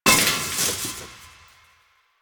Chair Stack Destroy; Warframe; Ambience; Nineteen Ninety Nine

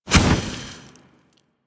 Fire Stop, Flame Thrower; Weapons; Warframe; Ignis Wraith

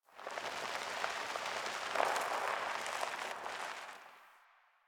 Tire On Cement One Off

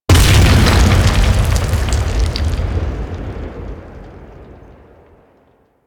Generic Environment Explosion; Mission Four; Warframe; The New War

